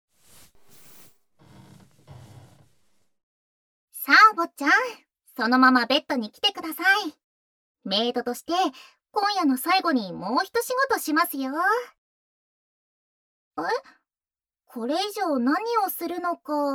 耳舐め 寝かしつけ

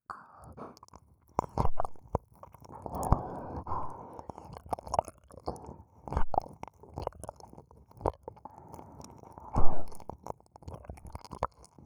ぐっぽりれろれろ; 耳舐め; 喘ぎ、耳舐めのみ

